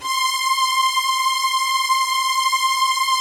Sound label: PAD, DIGI-PADS